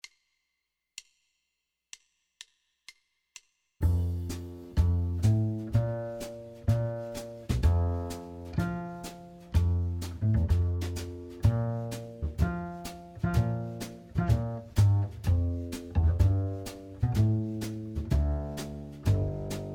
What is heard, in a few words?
piano guitar play along, F blues, jazz